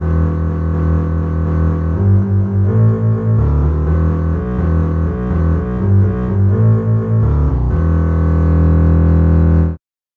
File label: contrabass bass